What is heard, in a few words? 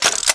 chaingun activate, weapons; Tribes2